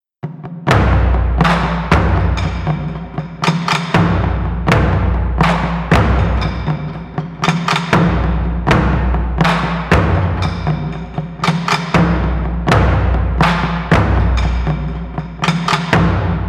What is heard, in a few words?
Taiko